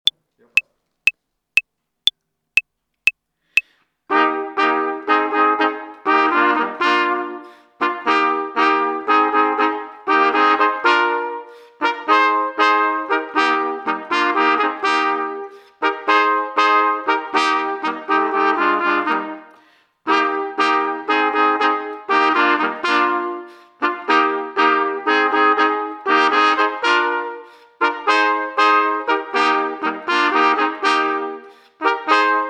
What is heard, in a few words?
Mini ROCK Tutti